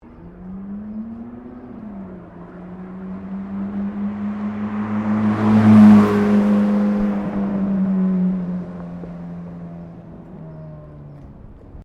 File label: Car Ferrari Driveby; Automobile, Motorcar, Transport, Vehicles; Vehicles - Artificial; Cars